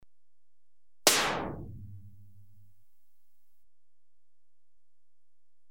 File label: Compressed gas gun (single shot), Electronically Generated Sounds; Sound Effects Library